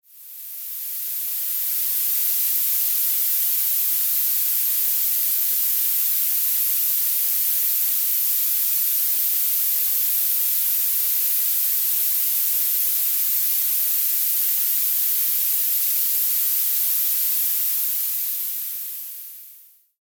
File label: tinnitus resolver 13500hz